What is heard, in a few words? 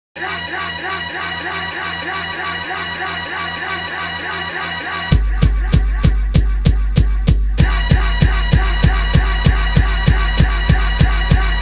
Intro (instrumental)